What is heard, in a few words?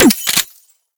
Shape Blast Shotgun Mech, Weapons; Warframe; Corpus